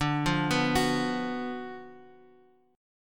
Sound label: F sharp Major 7th Suspended 4th Sharp 5th, D, chord